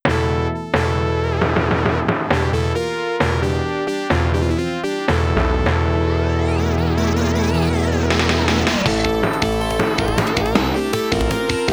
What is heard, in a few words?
End Sequence FM Synth